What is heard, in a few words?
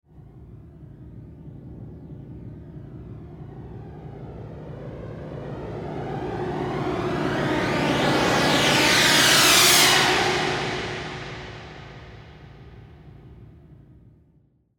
rising flanger